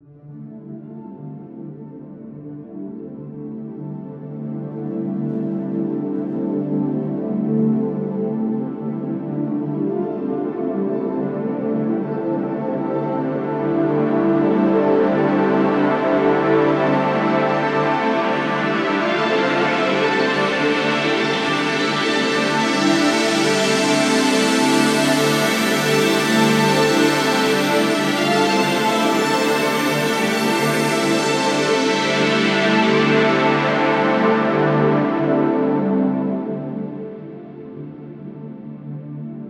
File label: washed arpeggio, Amin, 105; daily samples